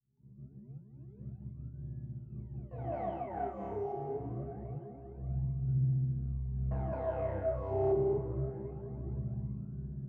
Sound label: Filtered Noise